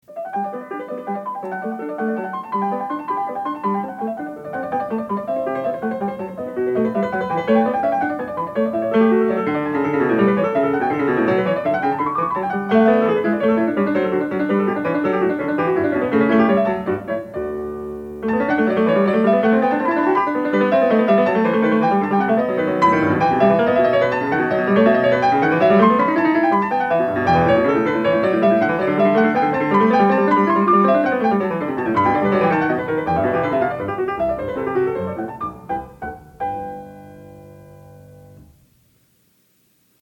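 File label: Corrente, piano; Partita 5 in G; BWV829; BACH; PARTITAS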